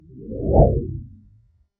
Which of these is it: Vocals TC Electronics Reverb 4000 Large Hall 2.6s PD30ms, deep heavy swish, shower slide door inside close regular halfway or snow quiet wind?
deep heavy swish